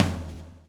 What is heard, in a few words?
swing